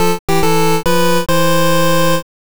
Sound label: jingle clear